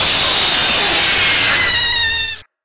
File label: jumpscare sound; fnaf evolution